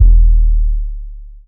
ELE BD, Drums Dance & Groove; USB Soundscan vol.11 - Drums Dance & Groove; AKAI

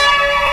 BAGPIPE, FAIRLIGHT CMI IIX WOODWIND

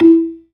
PERCUSSION, ToneWheel Organ; E-MU Formula 4000 Series Vol. 3; Analog Odyssey

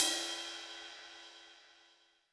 ride, Zoom MRT-3B

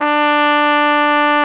BRS Flugelhorn, Roland; Roland L-CDX-03 Disk 2

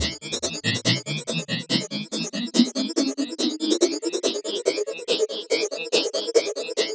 ALIENPULS 2; Spectrasonic Distorted Reality 2

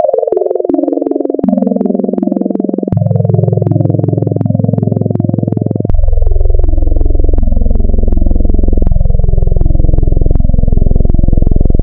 Add BinAdd Int 4, wavetables Additive; Architecture 32

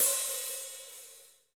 HH, KIT Real-Kit; Real-Kit s12

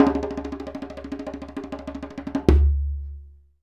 Djembe Roll of Suspense; Essential Percussion

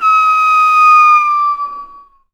bird calls, percussion

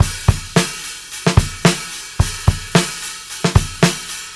Live Beats, R&B Drums

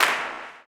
CLAP, blues & soul; ILIO; Vocal Planet

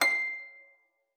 HARP FORTE B6; Xsample VOL-8

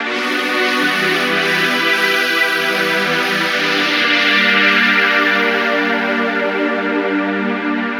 Pad, Trance

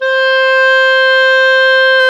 CL C 4, Clarinet; Sound & Vision - Gigapack I CD 2 (Roland); Brass&Woods1

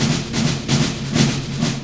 drum 130, techno trance drumloops

